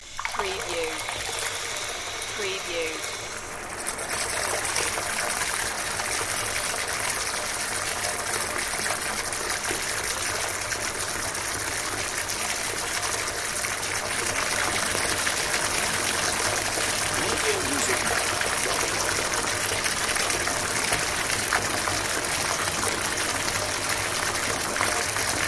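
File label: Turn On Full Tub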